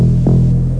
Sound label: bombom